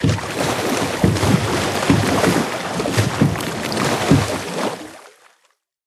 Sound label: Viking ship Oar